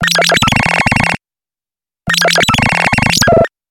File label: scribble